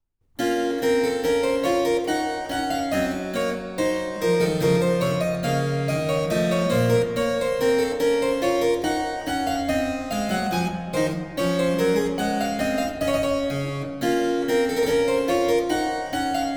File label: French Suite, for keyboard in B minor, Menuet; BWV 814 (BC L21); Bach